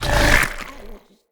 Infested Femur Shoulder Fire Stop

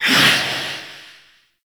Vocal Melee; Reaper; Warframe; Wraith

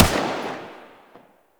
Shot, Rifle; Warframe; Eidolon; Rifle Lancer

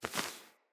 Wing Flap One, Animals; Floppet

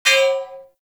Bullet Impact Debris Pipe; Warframe

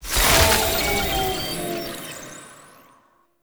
Enemy Use Hindrance; Warframe; Nineteeen Ninety Nine; PvPvE

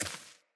Sparrow Step Default, Footsteps; Animals

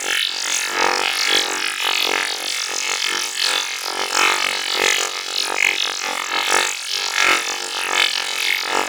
Laser Loop; Warframe; Corpus

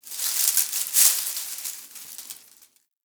Derelict tree shake; Interior; Ambience; Warframe; Orokin Derelict

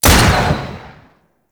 Scope Gun Shot, Weapons; Warframe; Corpus

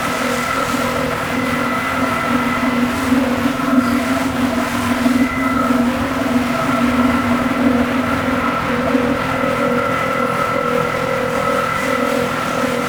Eye Tornado Loop; Ambience; Gameplay; Warframe; Orokin Moon

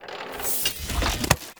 Mask Close, UI; Duviri; Acrithis

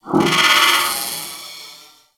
Oro Reactor Core Small Stop; Warframe; Orokin

